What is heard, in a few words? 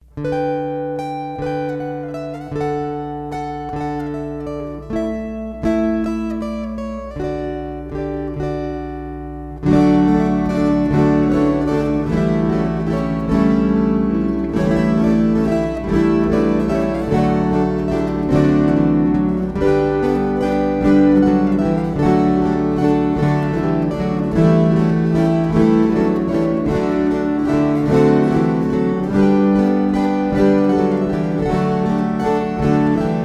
Waltzes